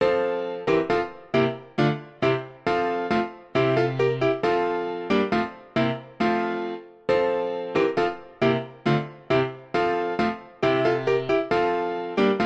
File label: classical piano; deck the halls